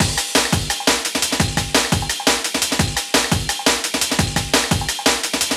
Let It Roll Beats, breakcore breaks

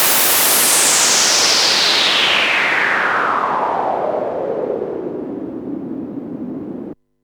Yamaha CS5, Filtered Noise; noise hiss crackle

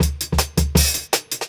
Jungle Breaks

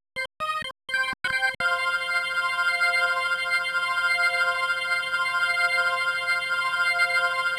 125 Hammond Chords C; Keys; PowerFX - Nu Jazz House

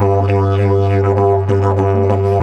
G 100, DIDGE 100BPM, Didgeridoo

